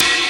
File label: DANCE SET, DRUMS